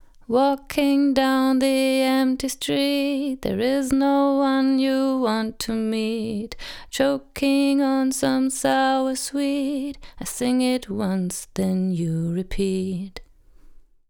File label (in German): Tubesessor Vocal manuelle Kompression Classic clean; Heritage Audio